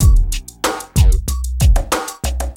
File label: BEAT, Beats 93-96bpm